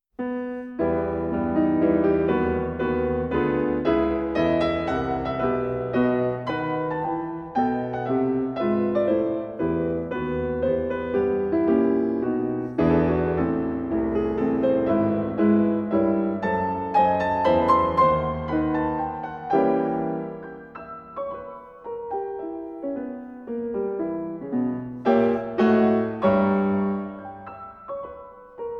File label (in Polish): Chopin koncerty single piano